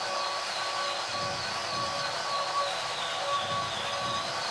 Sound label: Gale Boomerang Ready Loop